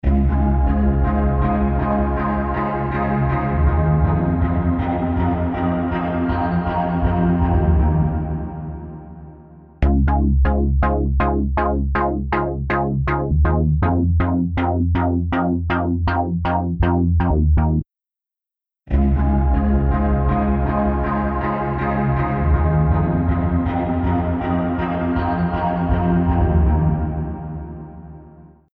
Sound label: House Synth Float